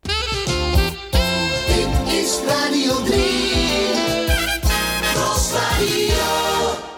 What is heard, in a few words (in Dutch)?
omroep jingles